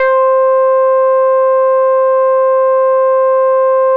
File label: Boom da Bass; E-MU Producer Series Vol. 6 – Analogia Project